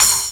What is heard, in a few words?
Hi Crash, Fairlight IIx CMI